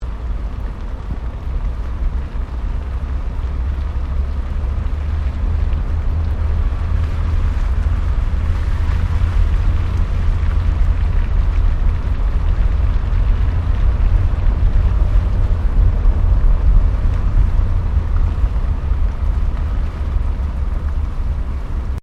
Car Toyota Starlet accelerating; Toyota hire car in Japan; Cars; Vehicles

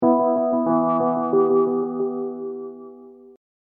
Hip Hop Dark Rhodes Licks 90 Bpm Straight E

